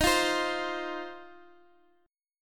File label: C Minor Double Flat 5th D sharp